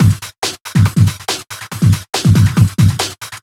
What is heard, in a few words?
Loops Breakbeat; Vengeance - Essential Clubsounds Vol.2